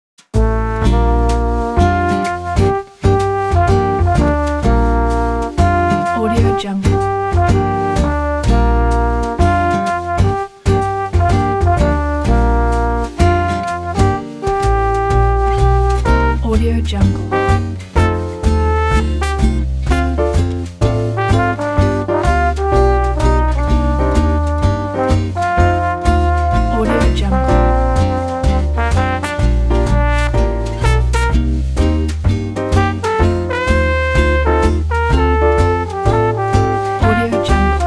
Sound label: jazz trombone